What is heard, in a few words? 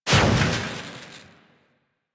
Drone Explosion; Warframe; Caliber Chicks minigame